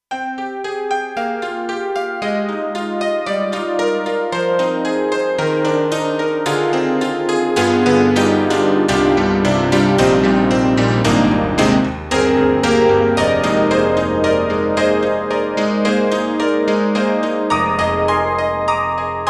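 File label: Roland SC-8850 Honky tonk, piano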